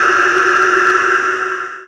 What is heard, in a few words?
death dies